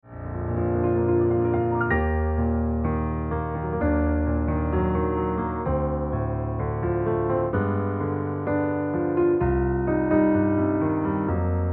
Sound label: Piano Music